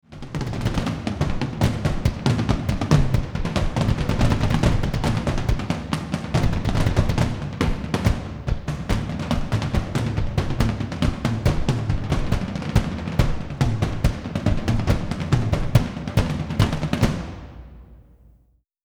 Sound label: Drums Percussion